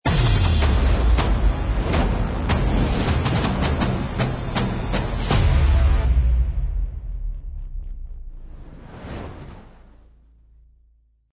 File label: Fire Logo